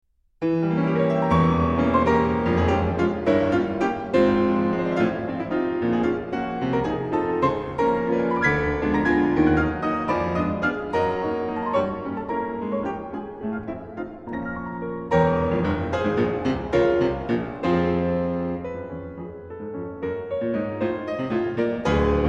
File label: lush steinway